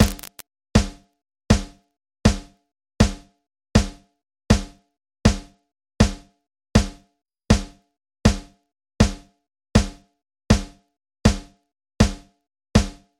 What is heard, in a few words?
drum